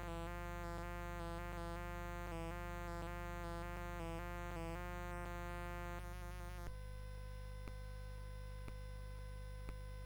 Music Box, CSG8580R5

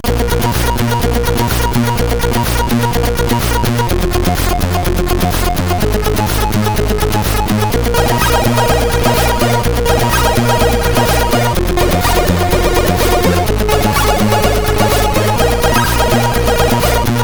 Game Music, sid CSG8580R5

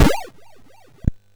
sid MOS6581R4

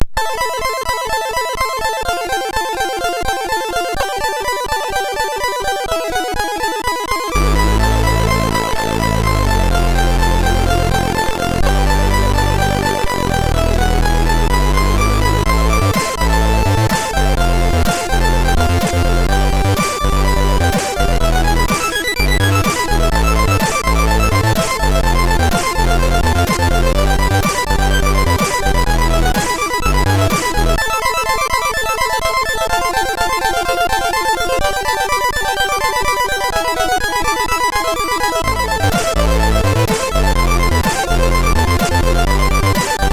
sid, MOS6581R3

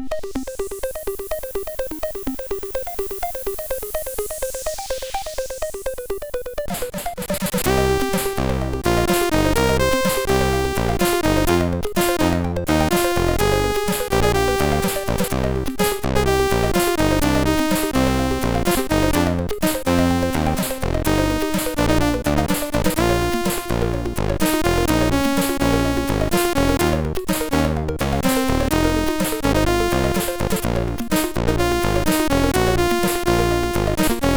sid MOS6581R3

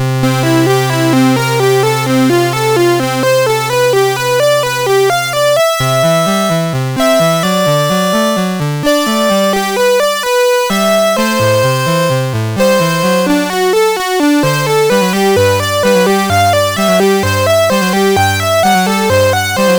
Little Prelude in C and G, MOS6581R3

